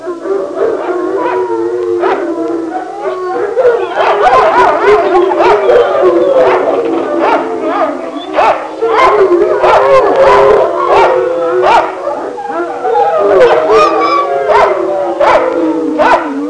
Wild dog pack

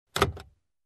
Closing Fuel Cap

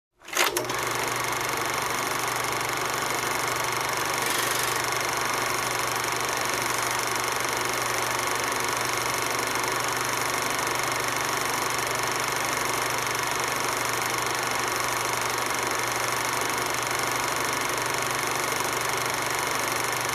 Cinema Camera Projector Running

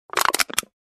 Empty soda can crash

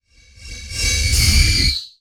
Iron thumping and screech

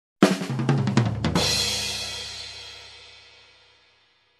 Jazz drums tom fill

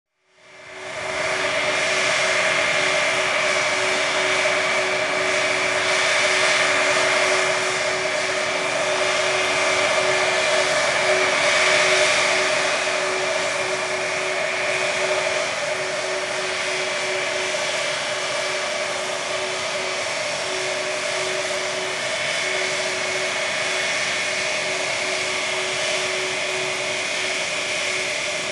Helicopter generator

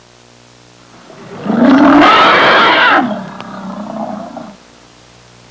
Generic growl

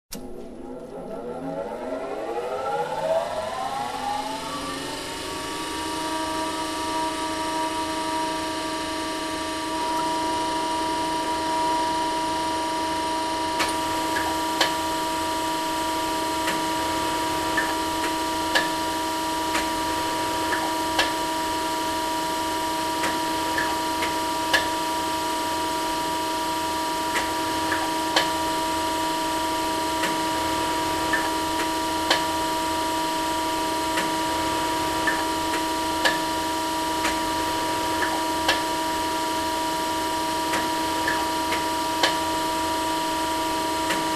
Manufacturing Machine Turned on

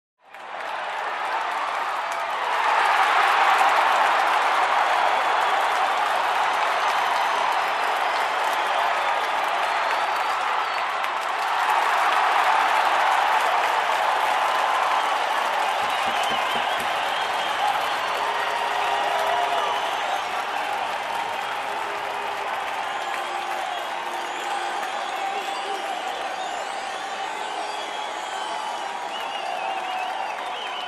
Fans Cheering and Applauding

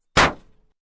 Fell off the Roof Sound

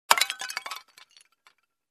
Plastic tinkling

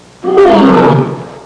Lion roar inside cage

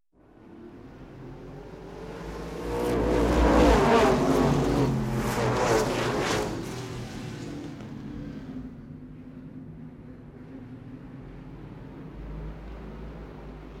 Motorbikes Passing by

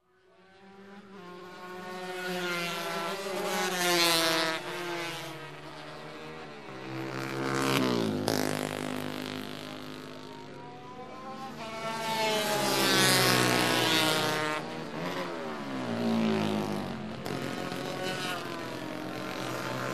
Motorbikes passing by on bike race

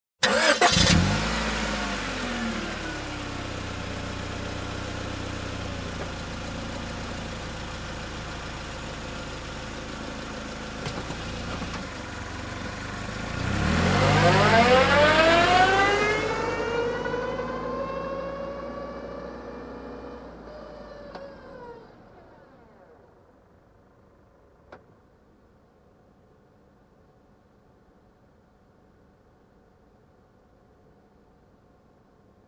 Car engine ignition and reversing

